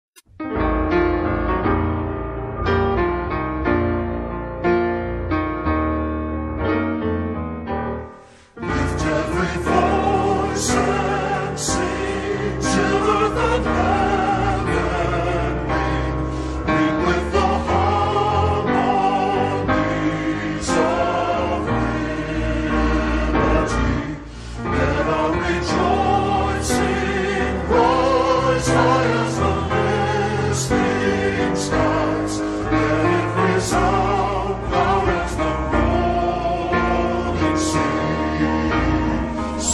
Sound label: lift every voice and sing in e flat major